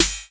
snare high snap cutter, Classic Chicago House Drum Hits